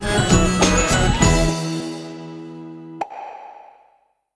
game win; plumber soda

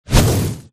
fireball; mineworld

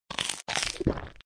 eating; skillfite

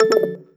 error

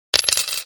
update price private

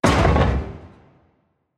Crate Land

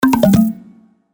Lose

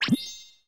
success

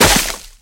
mech impact

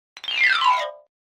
Troll Xylophone

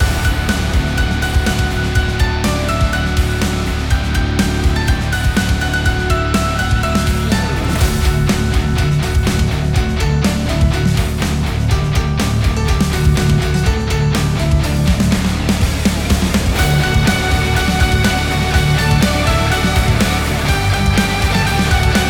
Jingle Bells Metal loop; MadXMas

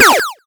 enemy shoot; sky knight

